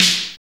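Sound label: SD, snare drum; X-Static Goldmine 1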